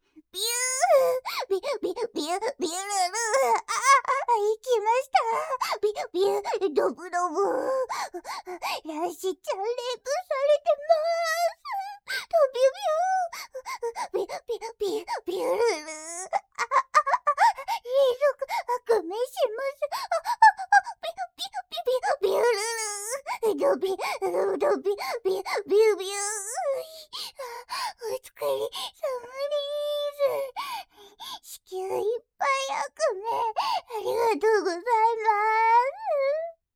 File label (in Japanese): びゅ～～♥びゅっびゅっびゅっ♥